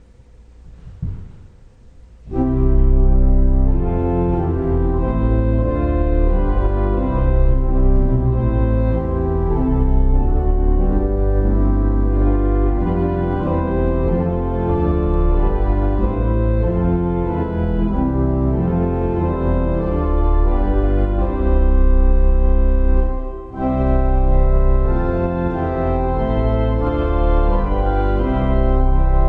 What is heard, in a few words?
Hymn, organs; StRupert